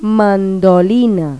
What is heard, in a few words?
MANDOLIN; Pipo-Aprende musica con Pipo